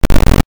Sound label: squash; Cavern-Quest